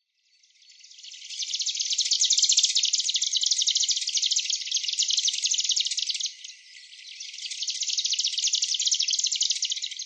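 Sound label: marsh grassbird, watarase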